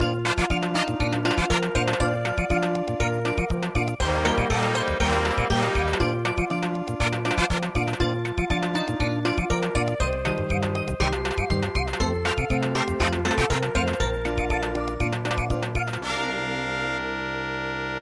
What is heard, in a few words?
samba, midi